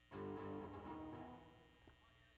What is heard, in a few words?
Bass